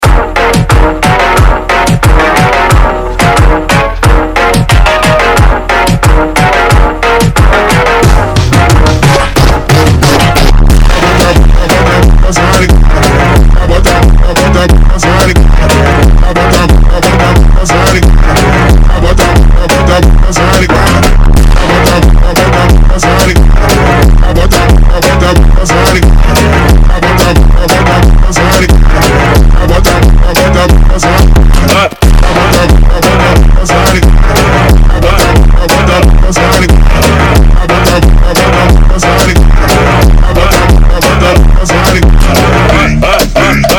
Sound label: phonk funk; Фонк